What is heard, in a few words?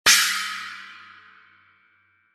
zildjian